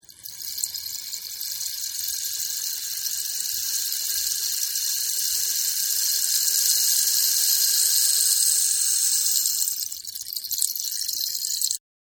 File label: Rain Stick